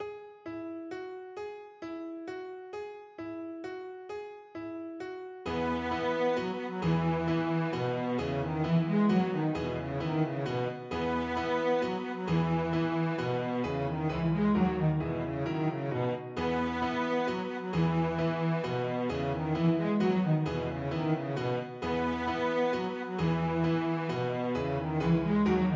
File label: carillon, cello; Bizet